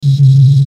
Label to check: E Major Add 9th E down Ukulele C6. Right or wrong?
Wrong